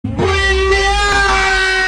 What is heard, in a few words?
aaaaaaaaaaaaaaaaaaaaaaahhhhhhaaha